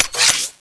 grenade pull pin

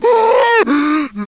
zombie burn

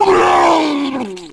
zombie infection heavy

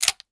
slide back, hkp2000; csgo; weapons

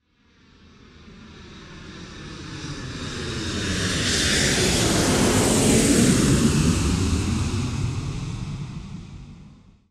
Supply Airplane, airsupply; sorpack; Origin

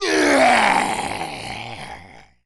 zombi death, male

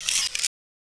grenade deploy

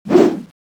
slash, weapons

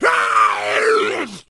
zombie infection

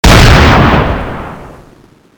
m95 shoot

